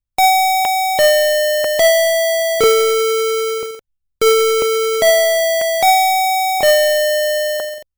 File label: Westminster Quarters